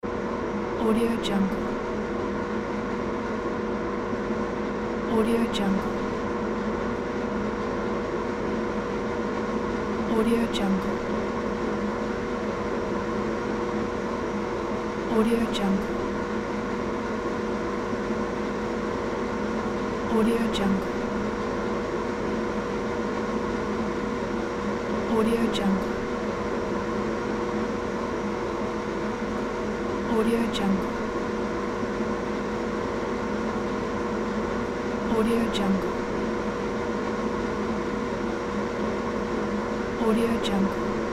air conditioner

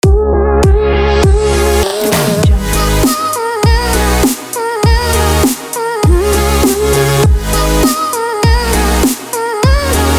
urban glitch hop logo

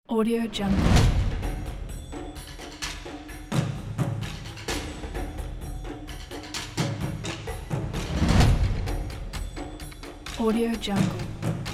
japan percussion

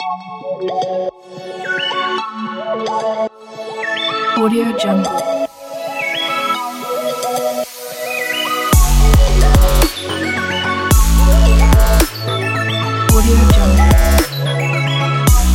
melodic dubstep

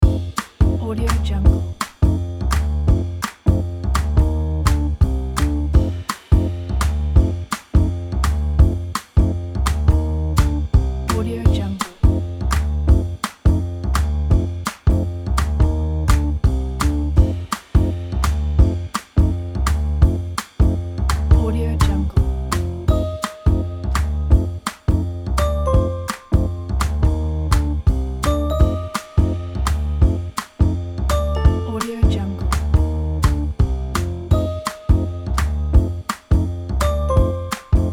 jazz voiceover background loop

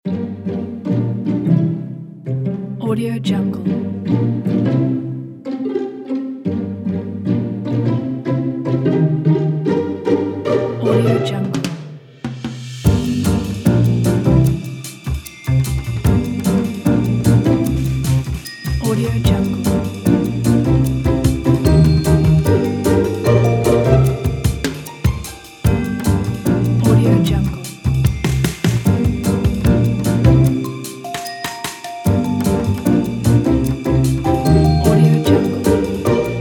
funny jazz adventure

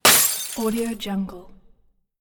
glass breaking; soundjay

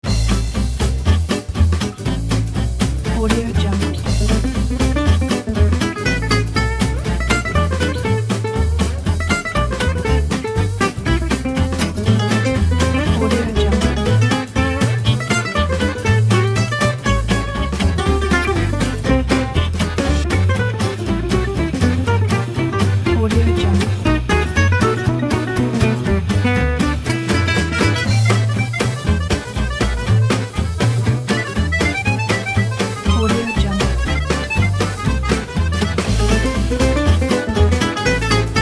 french swing cooking

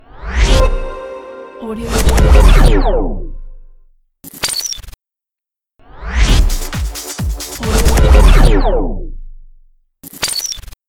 radio imaging logo

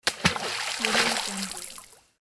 throwing stones into water

